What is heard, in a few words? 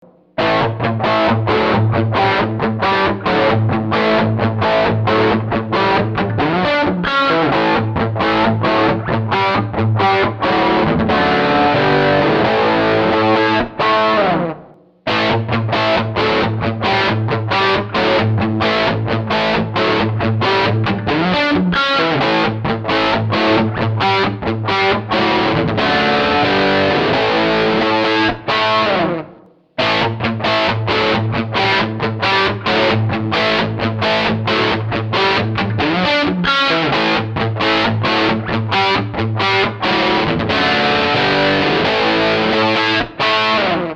tone